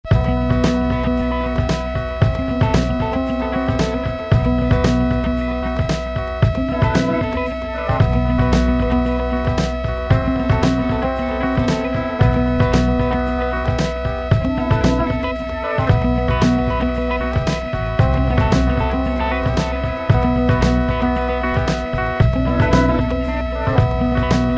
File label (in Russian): goticheskaya romantika